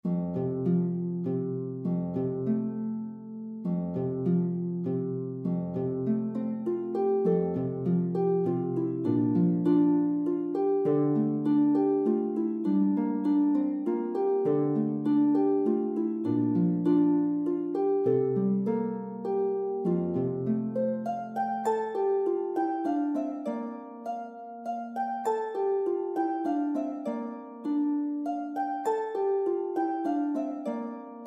Wind Garden is a relaxed tune in 3/4, reminiscent of a lullaby, Harp; Afghan Press Music; The sweet melody invites the listener into a garden